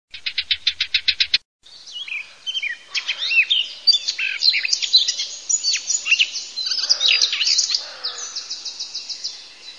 capinera, birdsound

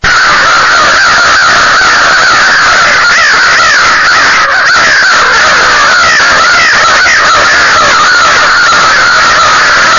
CORNACCHIA PASTURA

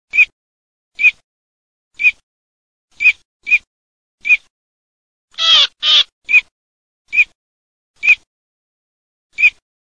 ALZAVOLA

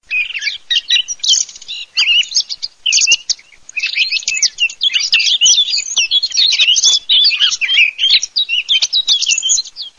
CAPINERA TORDO

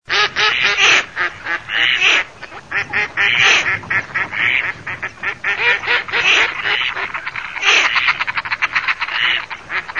GERMANO MORIGLIONE

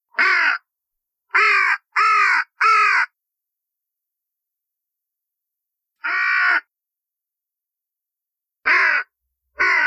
Cornacchie

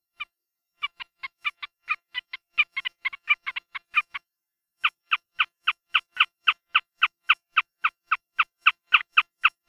beccaccino, birdsound

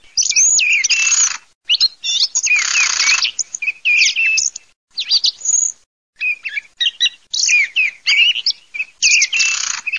TORDO TORDELA

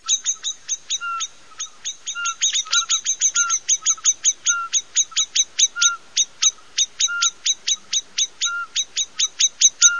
MERLO GUFO noise, birdsound

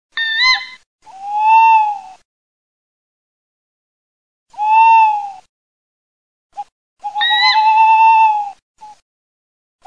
ALLOCCO CIVETTA

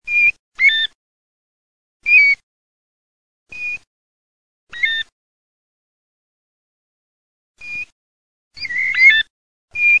PIVIERE

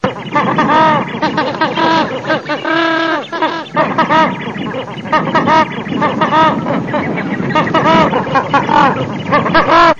OCA CAUQUEN COMUNE

